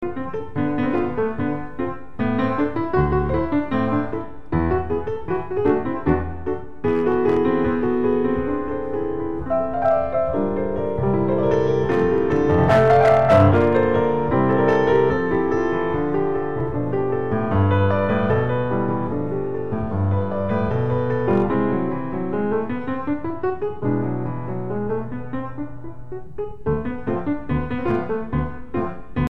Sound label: menuet